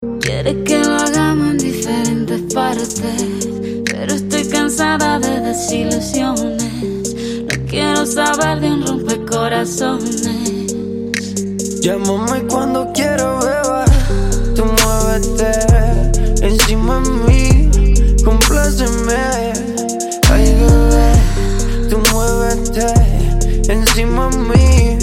trap ringtone

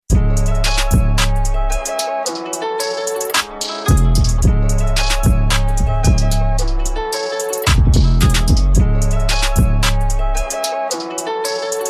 INSTRUMENTAL RINGTONE

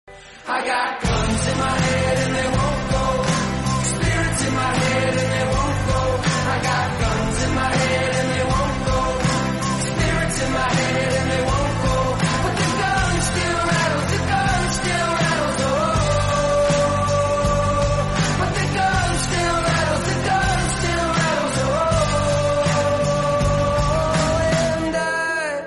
ENGLISH SONG RINGTONE

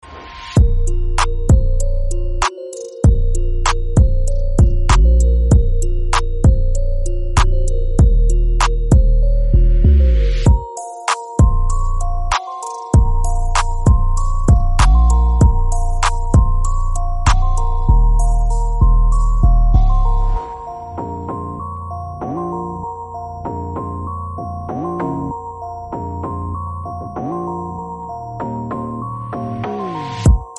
TYPE BEAT RINGTONE

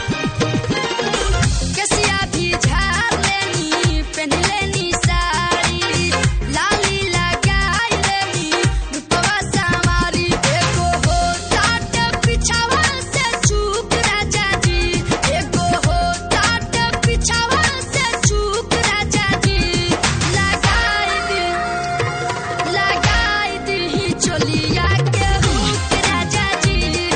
BHOJPURI RINGTONE

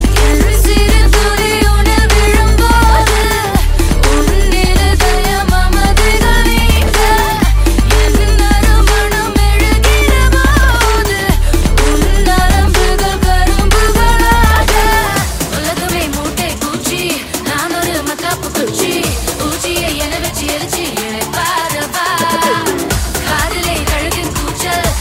TAMIL SONG RINGTONE; INDIAN 2

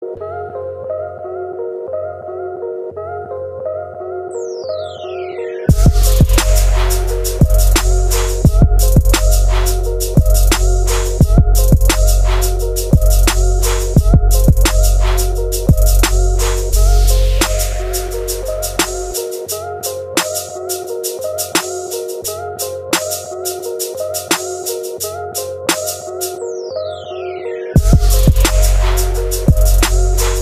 instrumental ringtone

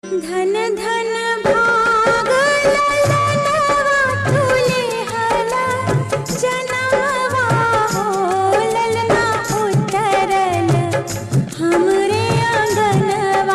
BHOJPURI RINGTONE

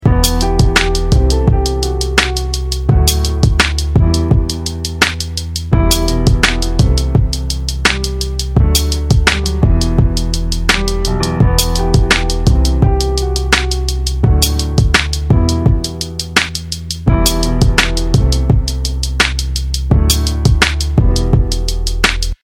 instrumental ringtone

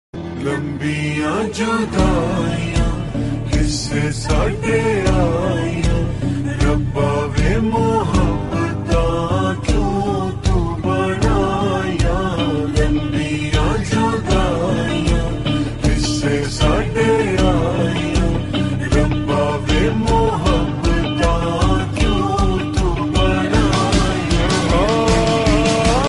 SLOWED REVERB RINGTONE

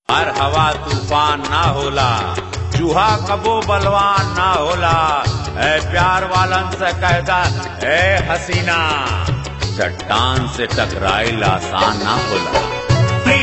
BHOJPURI RINGTONE

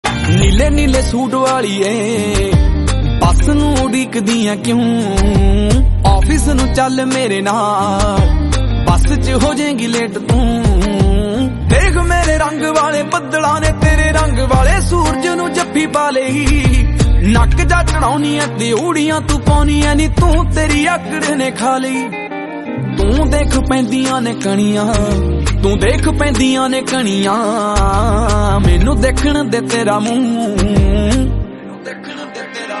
PUNJABI RINGTONE

